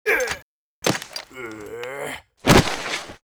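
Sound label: war die, Mob; vaffermorter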